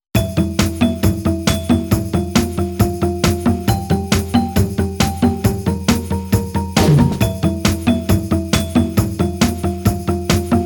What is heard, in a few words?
rumbling blues, Chiptune; Gamecube; Billy Hatcher and the Giant Egg